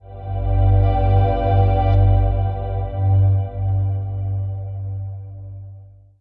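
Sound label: sting box gravity platform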